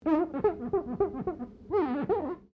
GLASS SQUEAK FINGER SEQUENCE

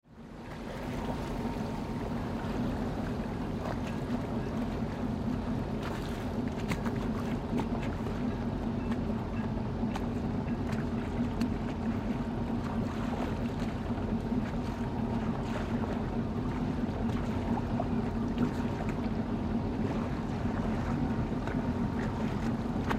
Yacht Idle Dock Seagulls

